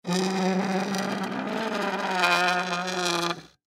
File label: Chair Movement, Chair Slide On Floor; Offices; Foley; SoundEffects-Blastwave